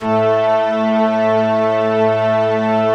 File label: ORG STRN